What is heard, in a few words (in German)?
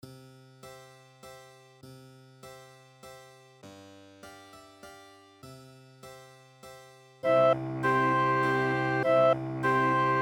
Menueett